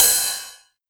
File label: 909 Ride; Novation Drumstation